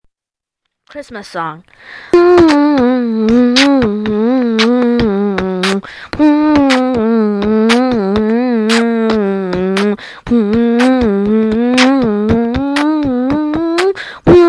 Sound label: Piglets